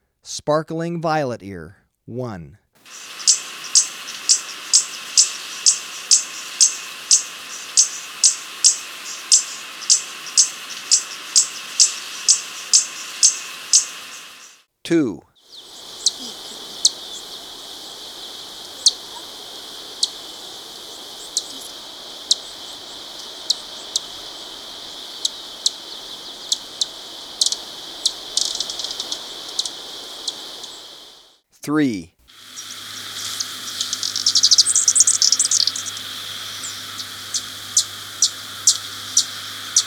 Sparkling Violetear, Birds of Ecuador; S. America

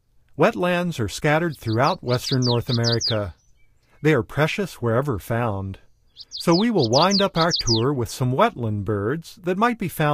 Common Yellowthroat; North America; Common Western Species; Know Your Bird Sounds

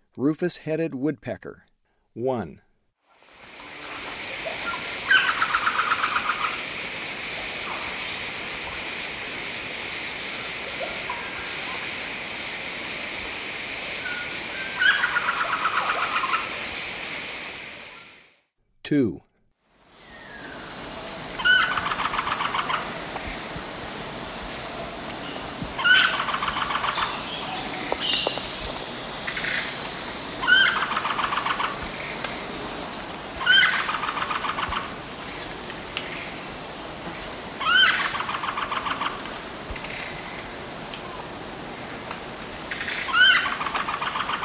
Rufous-headed Woodpecker; S. America; Eastern Lowlands

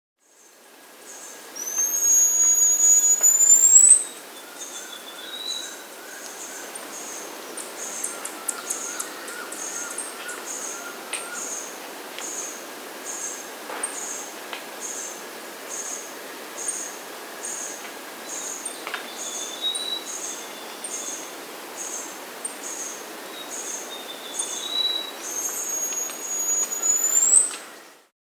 Masked Fruiteater, Pipreola Pulchra, S. America

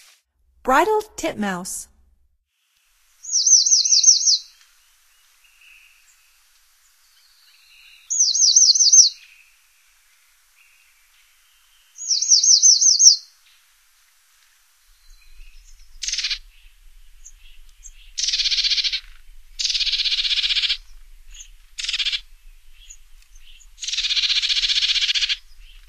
Bridled Titmouse, Songs of Birds of North America; Stokes

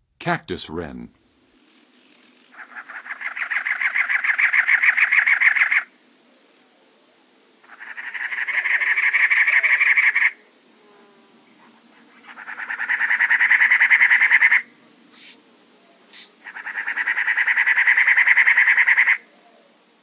Cactus Wren; North America; Western USA; Western USA Songbirds

